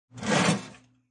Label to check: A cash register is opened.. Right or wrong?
Right